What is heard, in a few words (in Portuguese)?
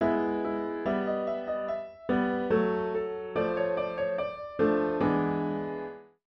descenso cromático da voz do baixo; Händel O Messias